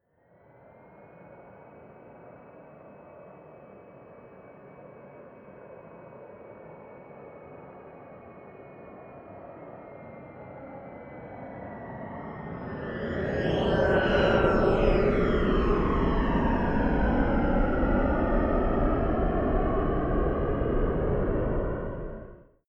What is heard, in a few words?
plane bombardier private flyby left to right distant